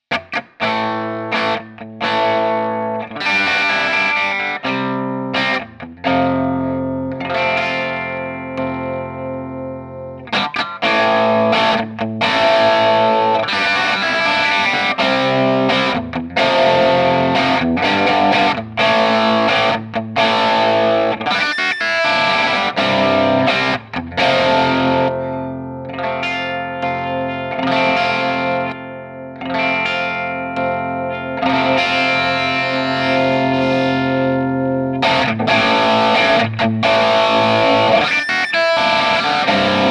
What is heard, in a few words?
uber-drive